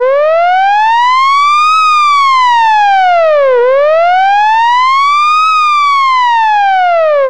police wail siren

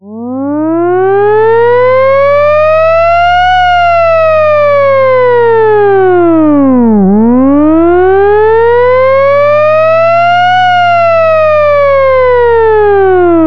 air raid mechanical siren

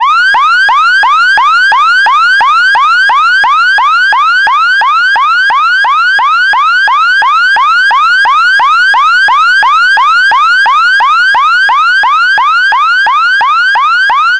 electronic yelp siren

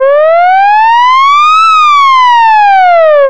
short alert siren

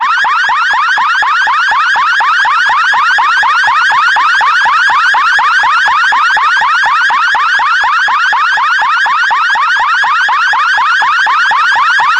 police piercer siren

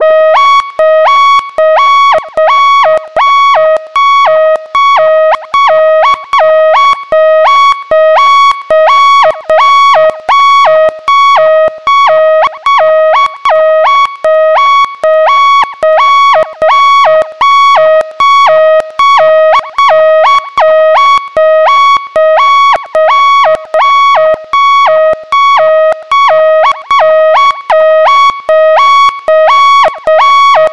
warning alert siren

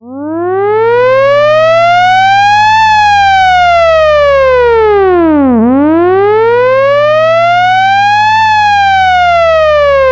air raid wail siren

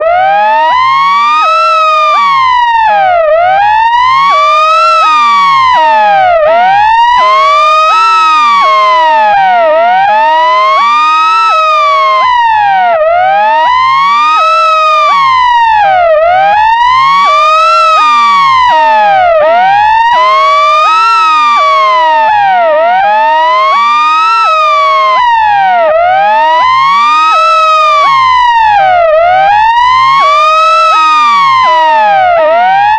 ambulance wail siren

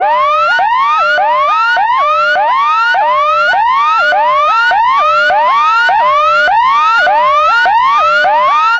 ambulance yelp siren

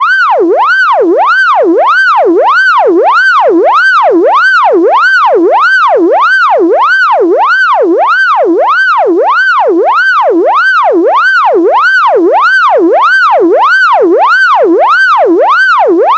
electronic siren

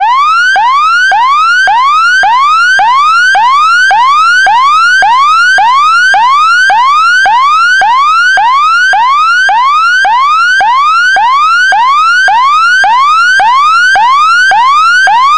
police yelp siren

